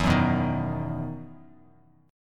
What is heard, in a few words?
E Major 7th Suspended 2nd E down Bass Standard; EM7sus2 strummed